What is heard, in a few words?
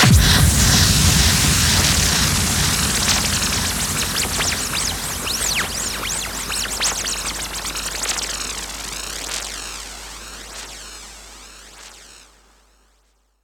Hallkick; Vengeance Samples; VEH1 FX